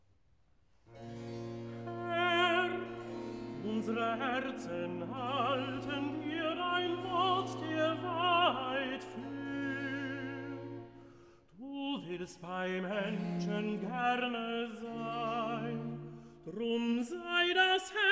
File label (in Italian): Recitativo (Tenor); Cantata, BWV 34 'O ewiges Feuer, o Ursprung der Liebe'; Bach; Long Melford; Whit Sun